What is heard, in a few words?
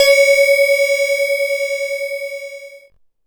C#, BELL GONG, Synclav FM; Big Fish Audio